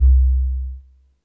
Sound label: Sub, drum; Subs; Drum Bits; layering